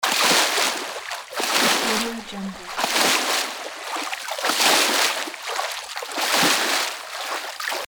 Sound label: swimming loop; tineside